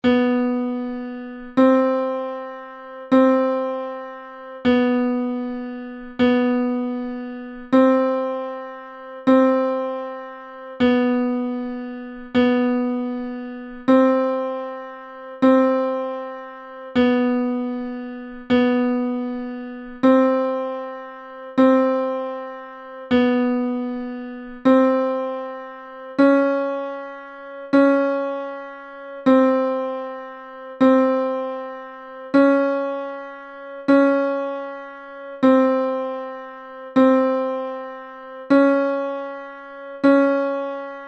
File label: Chromatic Trainer E small range, bansuri